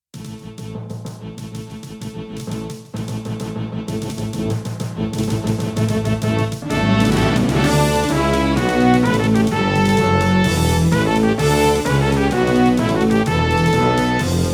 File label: Roland SC-88